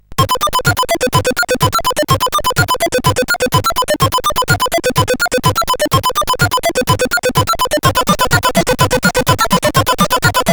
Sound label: Techbeat sid CSG8580R5